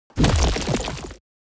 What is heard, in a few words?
building upgrade build